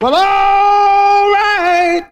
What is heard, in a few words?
well all right, SUNG